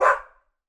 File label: animals dog labradoodle bark single; sound effects one